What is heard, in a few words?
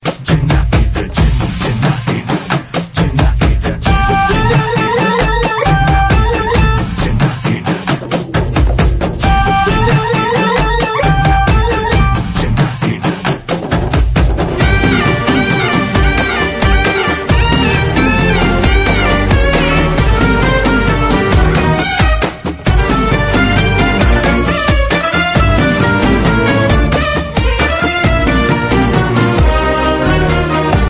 beat ringtone; kadaikutty singam